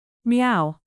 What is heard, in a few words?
meow